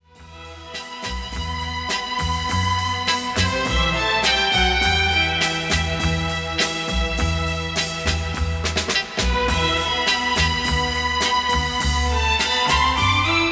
Orchestra and Chorus

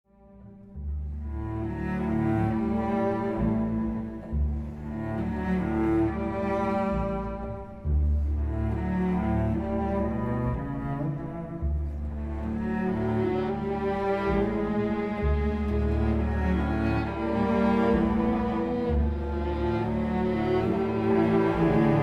Serenata for Strings

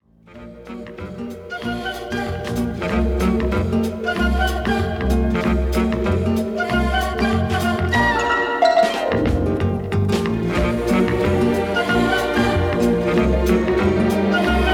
Orchestra and Choir

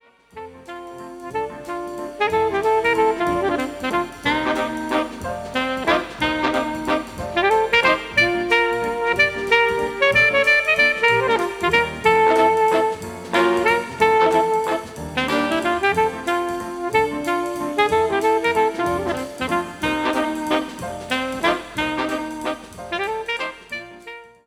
Mazurka

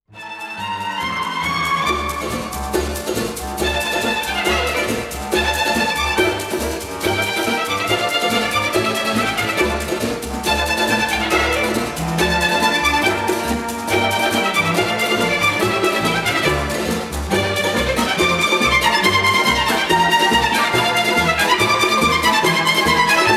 Chattering Samba

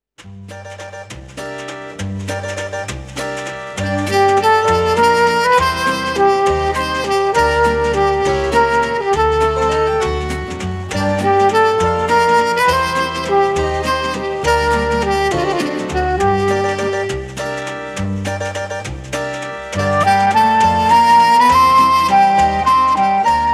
Band

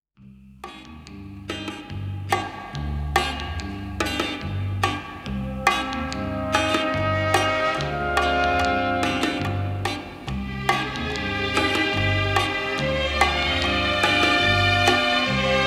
Orchestra

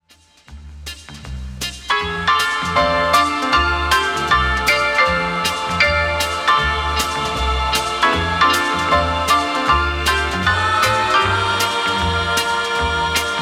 Orchestra and Chorus

